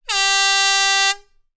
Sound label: sport air horn; sound effects one